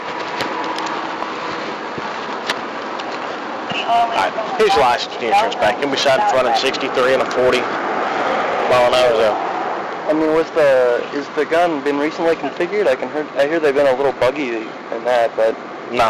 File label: buggy radar